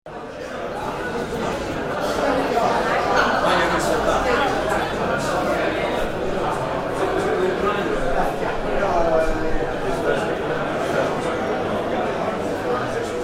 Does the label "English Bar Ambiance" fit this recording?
Yes